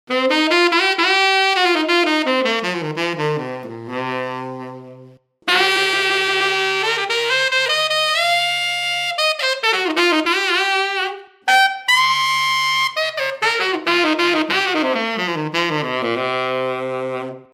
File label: D Minor, saxophone